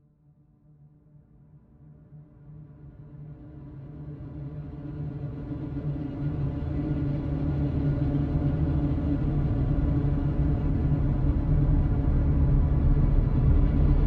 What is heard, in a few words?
tesla rumble with child